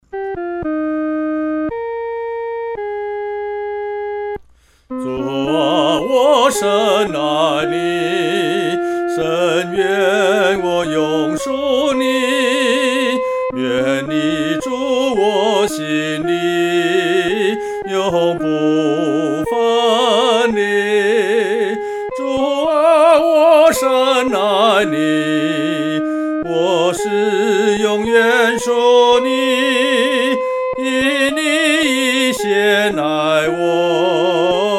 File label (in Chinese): 独唱 第一声; 主啊！我深爱袮